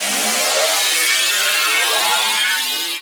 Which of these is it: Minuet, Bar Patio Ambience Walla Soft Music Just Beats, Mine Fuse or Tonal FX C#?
Mine Fuse